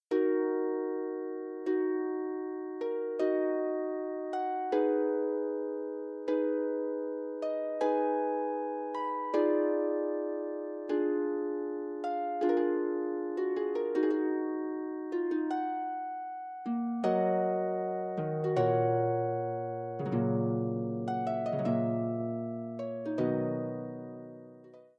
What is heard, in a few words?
prelude, Harp